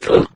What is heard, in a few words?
pain, flood